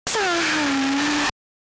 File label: Pikachu SFX; RBY